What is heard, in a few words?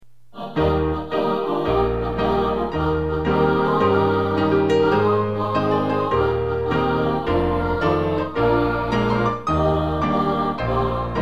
congregational accompaniment